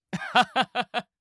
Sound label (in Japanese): アッハハハハ